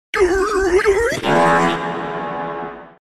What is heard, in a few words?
brain fart reverb